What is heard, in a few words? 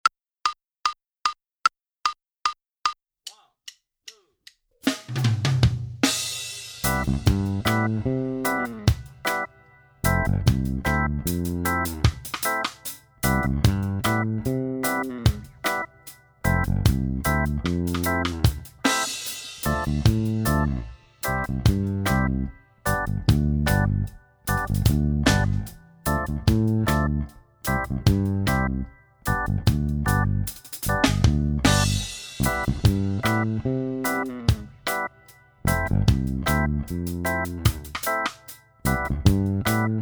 Minus Guitar; Inst Ensemble